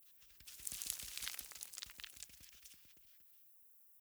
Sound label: Lizard Scuttle; The Town; 3D Environment